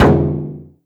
impact, metal barrel

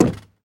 wood, player footsteps

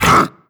grunt hard, creatures; vox

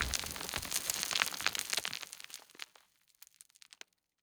rockfall; Counter Strike Model Pack; CS:GO; dust2

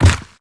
step soft, zombie blind

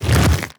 regular, zombie blind, foley; creatures

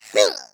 eggbot jumps, taunts; player; Portal 2

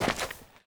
sand, player footsteps

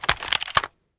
ssg08 draw, weapons; Counter Strike Model Pack; CS2

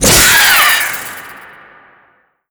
die, headcrab black; creatures; vox

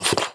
breath out, headcrab

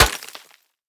concrete impact bullet

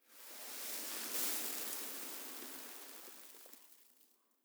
sand gust; Counter Strike Model Pack; CS:GO; dust2

